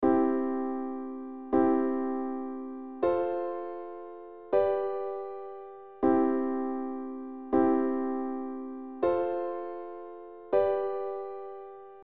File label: I I IV V, Progression Accords; Les bases harmonie